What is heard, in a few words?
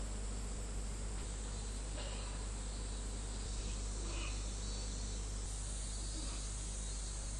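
window open and car parking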